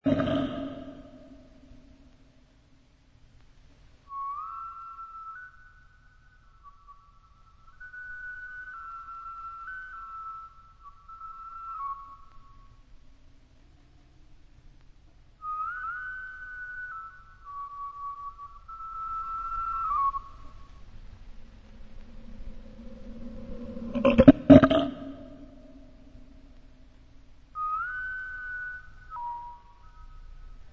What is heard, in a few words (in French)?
chant